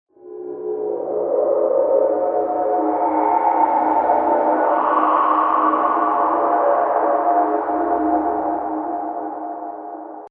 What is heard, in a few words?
Synthetic Swell